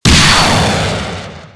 magic force

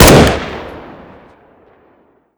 galil; weapons; dod m1918

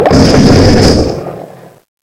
attack

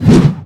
slash

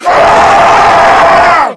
scream

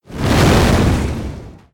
wind explode

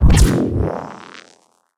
deform hit

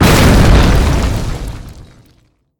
impact generic

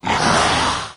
evade left solo, maggot; doom3

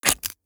bite, zombies

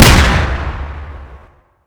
frag splode close

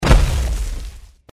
jump down, power armor; clothing system

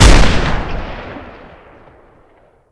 antimaterial rifle

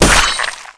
glaive hit

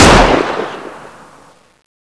fire, weapons; tfa cso

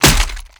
rifle swing hit world; weapons

